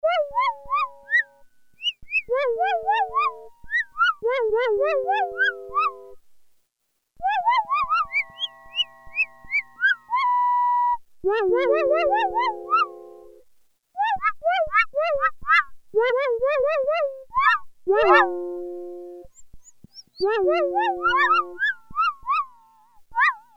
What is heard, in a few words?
BOX O' POPS, Oberheim OB-8; Factory Patches